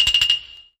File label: wood stick trill, percussion; Misc Percussion